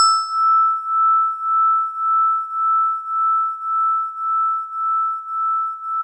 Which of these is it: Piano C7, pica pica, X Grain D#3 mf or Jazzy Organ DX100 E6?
Jazzy Organ DX100 E6